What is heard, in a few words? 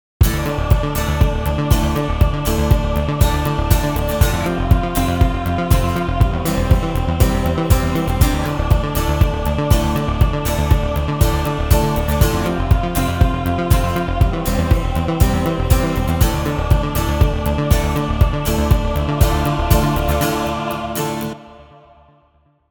Country Rock